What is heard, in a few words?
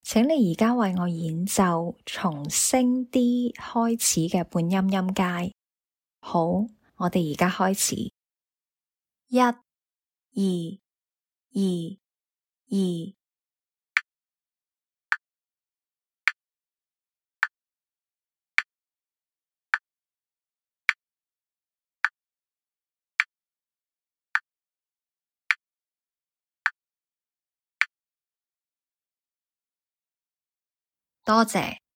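chromatic scale starting D flat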